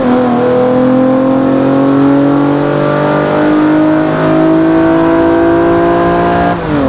third, vehicles; gmod; tdmcars; lex isf

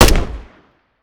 fire; gmod; viper; weapons

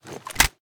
reload empty end; gmod

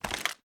raise up; reloads; gmod

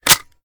reload fast close bolt; gmod

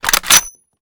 sn rechamber; gmod; iw8; alpha50

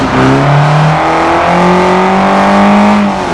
second, vehicles; 242turbo

